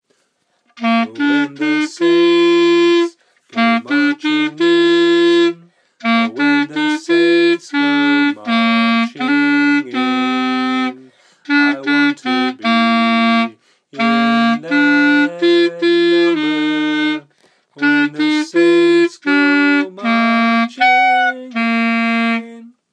clarinet practice

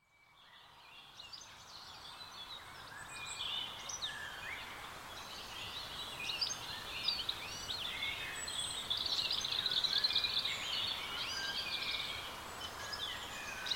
leitrim soundscape

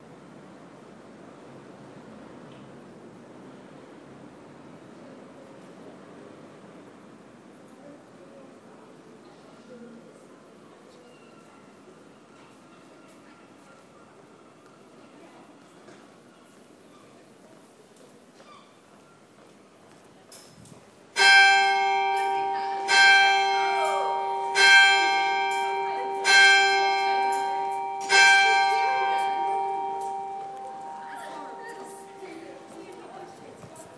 think this clock's a bit fast and loud

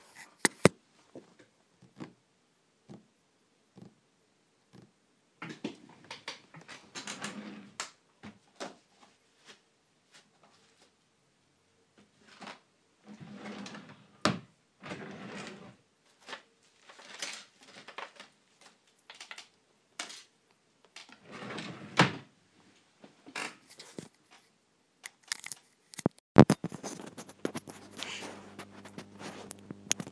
a quiet office

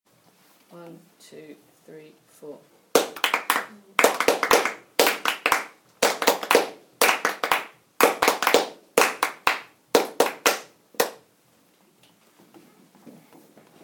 clapping rhythm

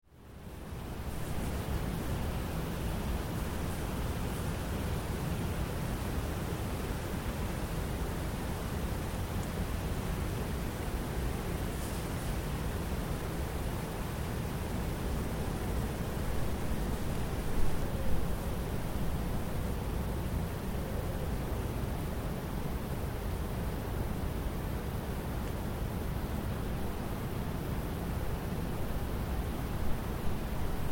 swedish forest

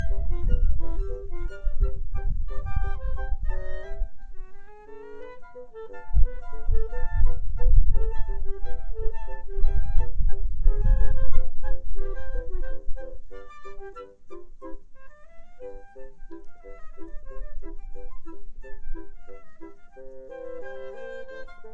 concert

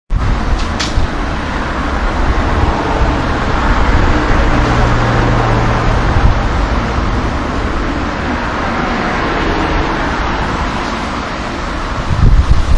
traffic noise stockport road ardwick manchester

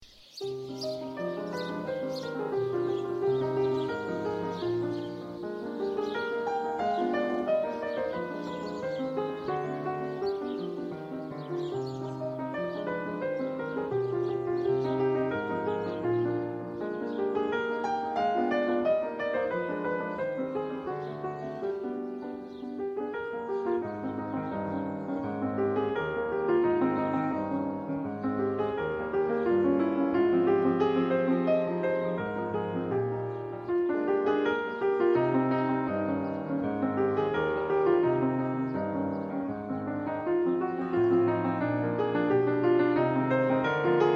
Song Without Words No14 in E flat major; mendelssohn; forest sound

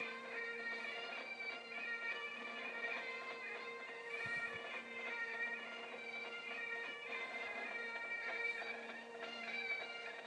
bagpipes on radio

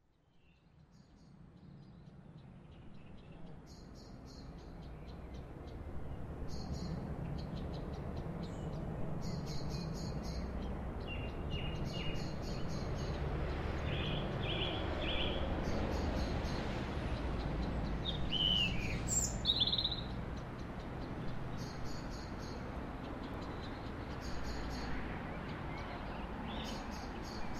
east luton evening soundscape

